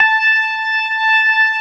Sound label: STOPS, ORGAN; Keith Emerson Signature Series